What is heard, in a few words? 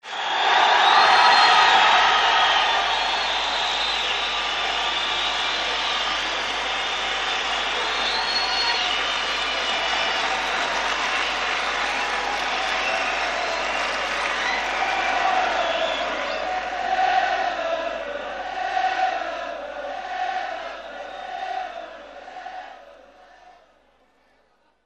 applause